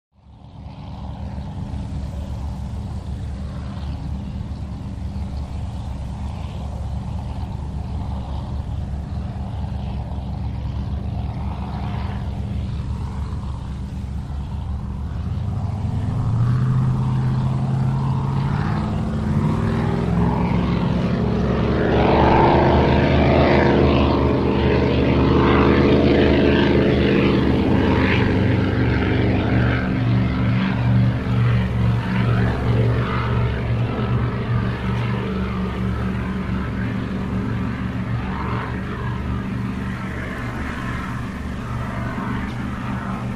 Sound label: Power Boats Amb Bird, off shore power boat